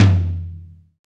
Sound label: TOM TOM